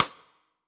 Rim Sidestick Hard Bright Room, Drums

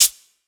Shaker Acoustified; Drums

